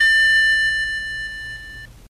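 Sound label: toy piano tuned to C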